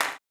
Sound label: CLAP, blues & soul; ILIO; Vocal Planet